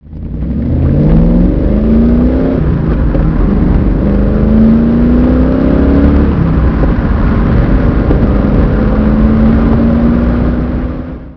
stag drive

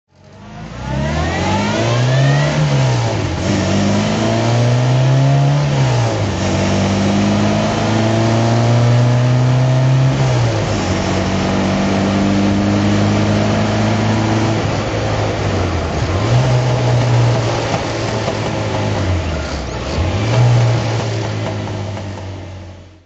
austin healey sprite frogeye driving

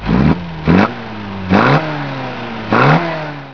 coop carb

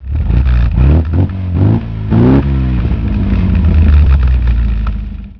mgb road

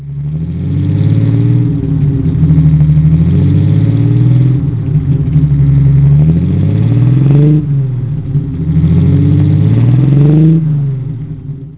hornet

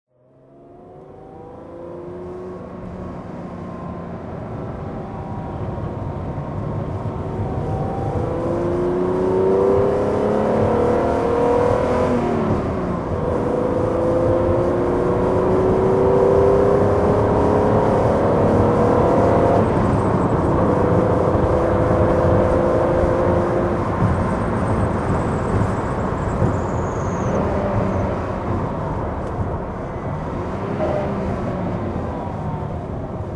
BMW 30 CSL alpina driving

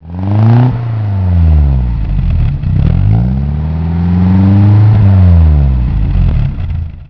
mga 1600

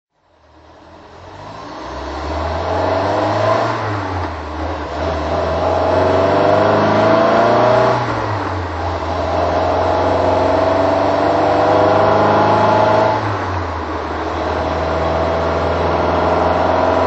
volvo p1800 driving; volvo p1800 jensen

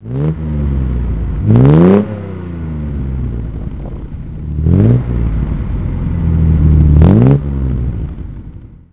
healey

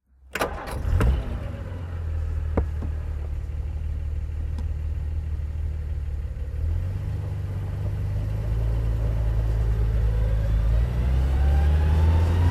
alvis te21 dhc driving

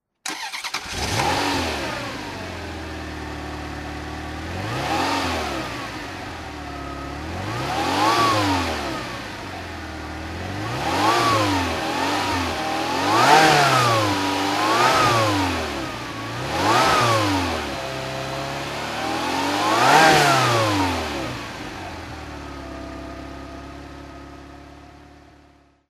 ferrari mondial t throttle

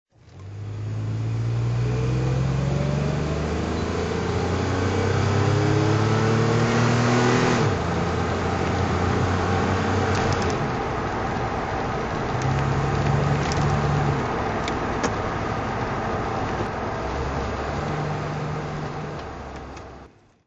delorean drive

